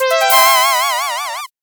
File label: bonus fanfare